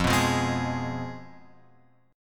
F Diminished, guitar chord; Fdim Chord {1 2 3 1 X 4} strummed

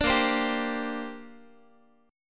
A sharp 6th Add 9th, Guitar; A#6add9 strummed

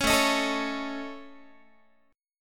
A Major Flat 5th A Guitar, chord; AMb5; strummed

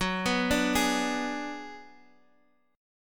Ab Minor 7th Flat 5th Gb

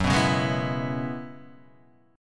F sharp 7th Suspended 2nd Suspended 4th

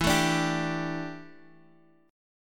G Suspended 2nd E, Guitar; Gsus2/E strummed; JGuitar

